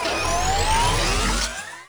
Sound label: Shock Rifle Fire Alt Charge; Warframe; Weapons; UT Weapons